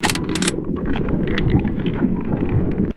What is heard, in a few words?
metallic gun cocking and deep underwater thump; guns